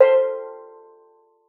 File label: steeldrum C4; Xsample VOL-4